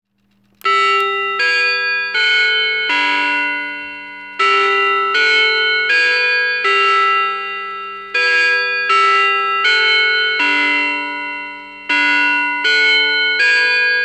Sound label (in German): Westminster BimBam Klangbeispiel